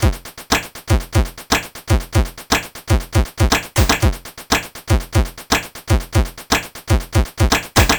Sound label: C64 Beat, chiptune drums; Commodore 64